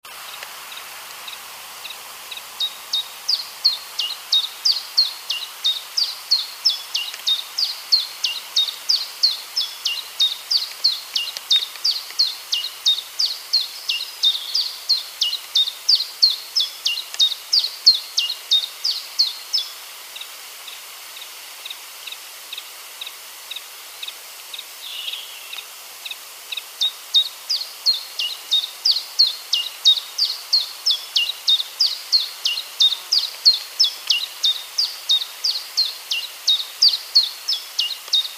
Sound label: Phylloscopus collybita